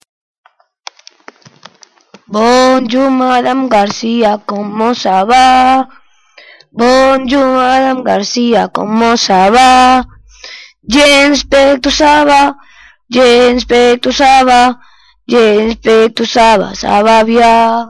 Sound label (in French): chanson